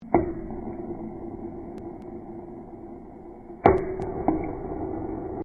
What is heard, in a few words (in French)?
gouttes lentes; Collection Synesthésie; Isabelle Grosse